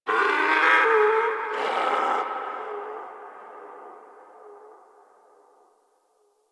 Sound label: Distant Creature; Ambience; One Offs; Warframe; Eidolon Plains